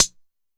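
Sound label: TR808 closed hi-hat, Roland SH-32 Drumset; Drumset 01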